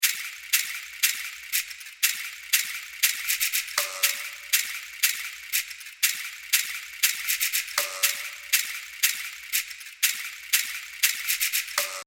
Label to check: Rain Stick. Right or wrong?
Right